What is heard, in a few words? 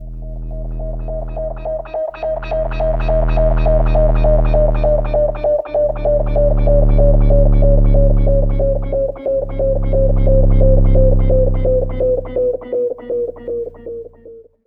ATMO, Special FX Twisted; USB Soundscan; AKAI